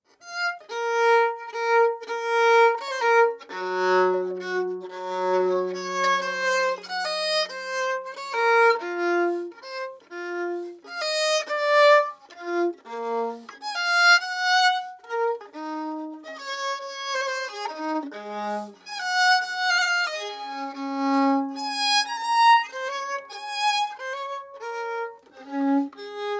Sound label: Baroque Bow; Telemann